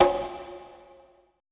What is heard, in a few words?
CONGA L; BPC DRUMS